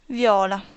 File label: viola